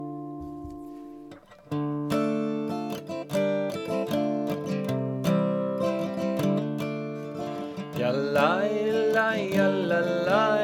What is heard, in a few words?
Mi Chamocha Low Voice; Hebrew language